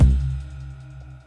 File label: Bassy Ride, Drums; BB 2HTC Samples Vol 4